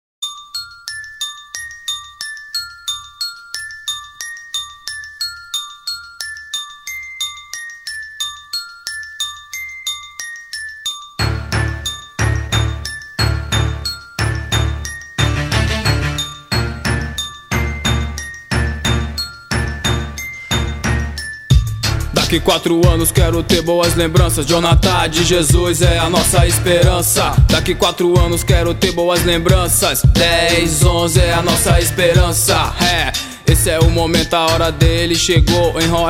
forro, rap

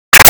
agogo; agogo de cosme

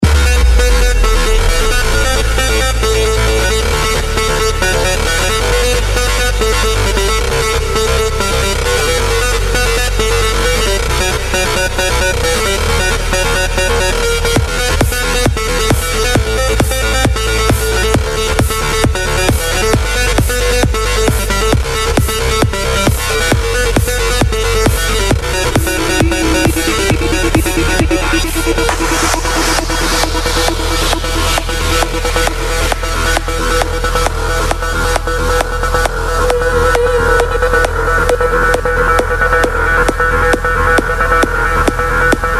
trilhas dance

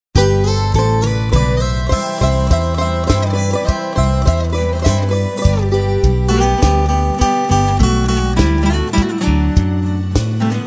toada sertaneja

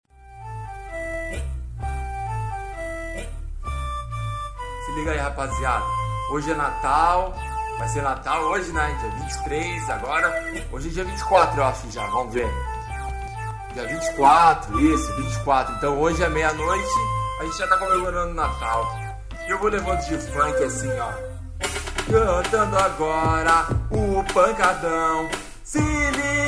natal music

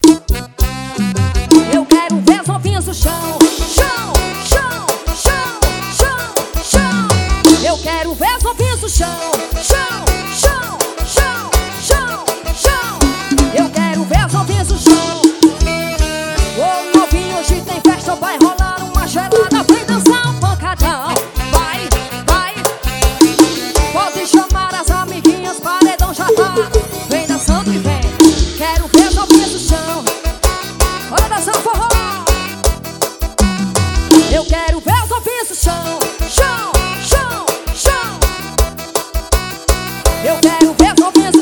forró ao vivo; jordy producoes

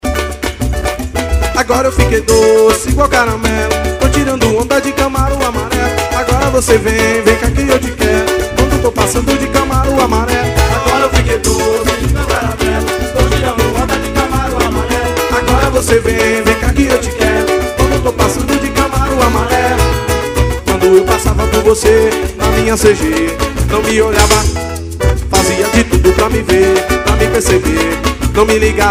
samba ao vivo

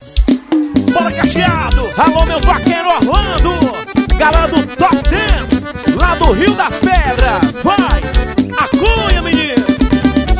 sequencial musical

